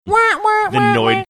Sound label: making funny sounds